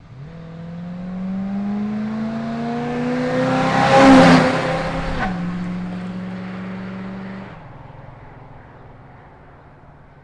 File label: Blazer By Super Fst, chevy blazer